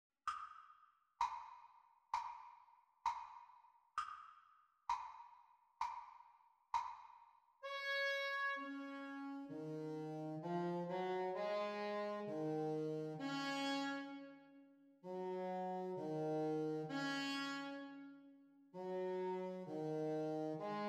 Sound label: Sax Duets Playalong; Practice Speed